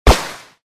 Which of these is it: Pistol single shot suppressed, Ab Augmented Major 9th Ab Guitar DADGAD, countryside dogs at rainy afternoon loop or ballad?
Pistol single shot suppressed